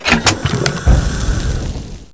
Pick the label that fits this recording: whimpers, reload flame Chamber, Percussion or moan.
reload flame Chamber